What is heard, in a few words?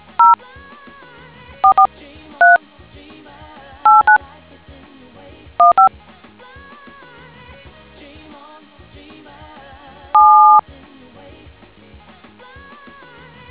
dtmf